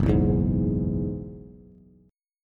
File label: Gb 7th Suspended 2nd Suspended 4th, Bass; strummed; JGuitar